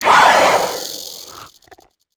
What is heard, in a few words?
imp die, monsters; DoomRTX; imp5